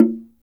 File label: SFT SLIT DR, Heart of Africa; SLIT DRM2